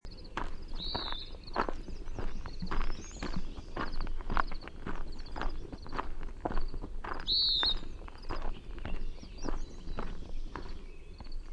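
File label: peeer, western wood-pewee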